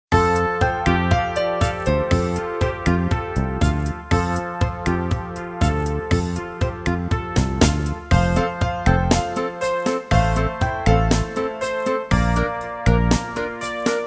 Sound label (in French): VERSION KARAOKE